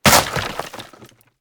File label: Bone Destroy; Angry Birds